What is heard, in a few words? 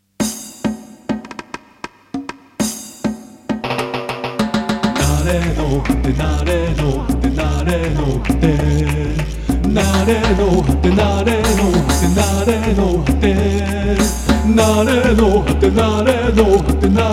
Jingle (Latin 2); FM NARENOHATE Jingle (Latin 1) -2024 Mix